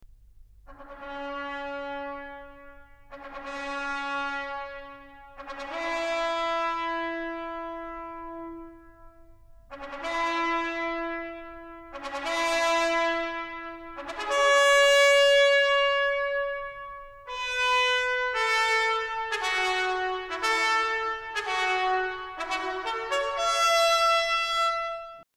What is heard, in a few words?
Trumpet; Chailly; fifth symphony on fate and love